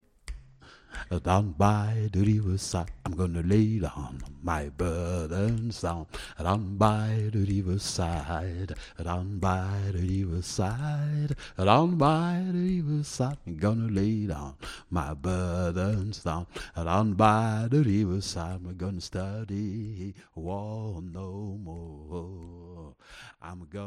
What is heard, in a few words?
Parade Jazz Gospel; Fete Des Tuiles